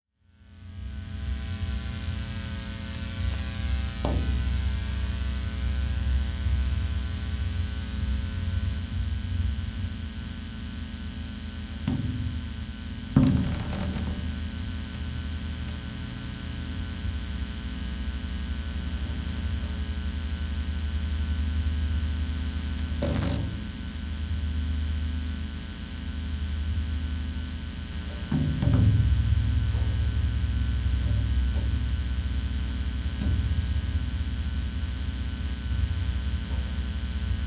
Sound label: Composition for Light Percussion and Ultrasound